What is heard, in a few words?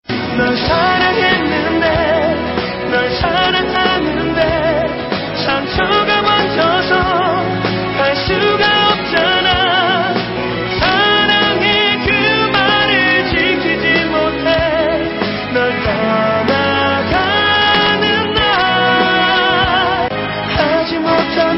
Korean dreaming